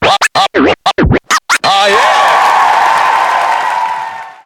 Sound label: Racetrack Race Finish; Trailblazers; macOS; PS4; Windows; Xbox One